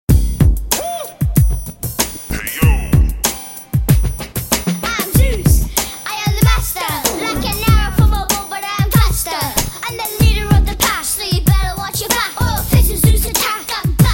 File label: Zeus Rap